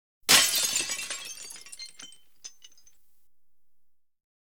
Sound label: Audience Applause